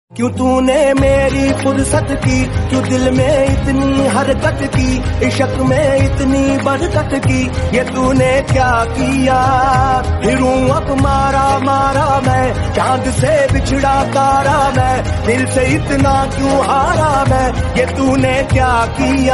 Hindi Ringtone

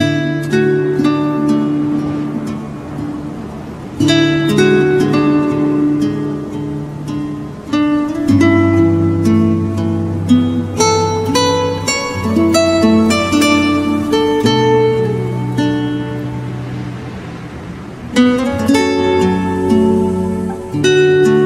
Samsung Galaxy J2 Core Official Stock Ringtone

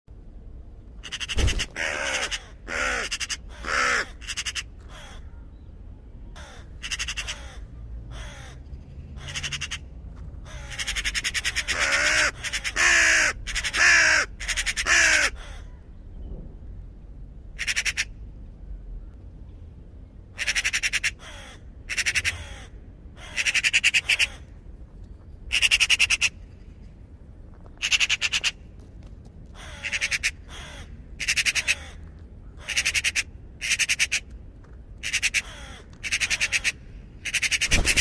Raven Ringtone

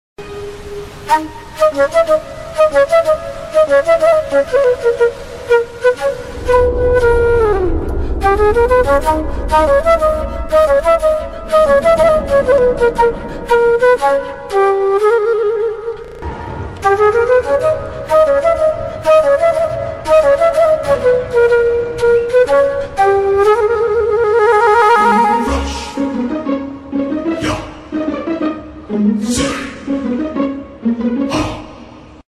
Flute Ringtone

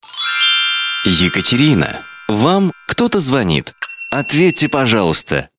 Samsung Galaxy Grand notification

